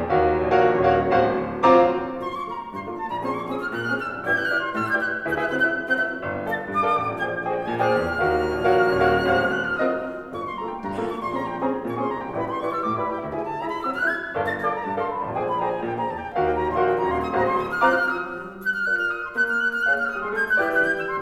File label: Sonata; No. 3; Movement 4